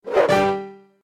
End Bonus; slots; Majhong Royale

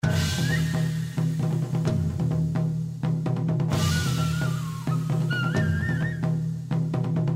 BGM Gamble; Kungfu Showdown

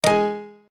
SpinStopWin; slots; Sushi Suprise

